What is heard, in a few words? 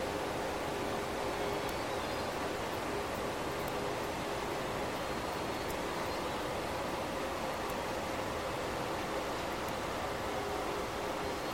audio SRKW, hydrophone; JASCO AMAR HYDROPHONE; calls; acoustic data